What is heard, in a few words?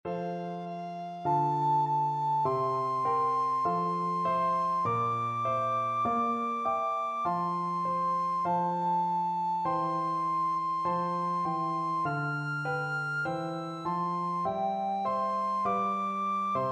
recorder